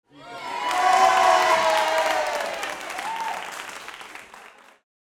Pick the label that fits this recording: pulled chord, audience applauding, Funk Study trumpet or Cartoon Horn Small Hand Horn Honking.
audience applauding